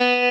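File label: Arpeggio, clean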